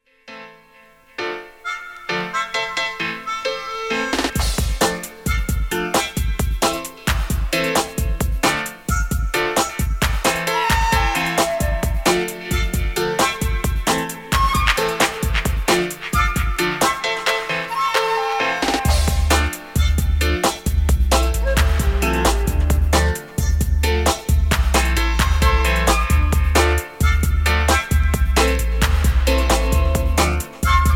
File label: flutes